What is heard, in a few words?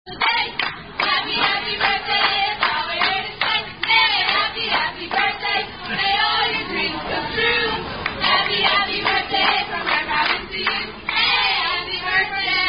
Happy Happy Birthday